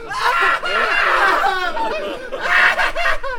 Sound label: crowd psycho laught